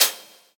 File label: nice open hi hat one shot c sharp key